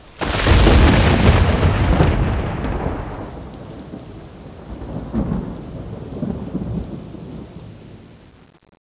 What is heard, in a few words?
thunder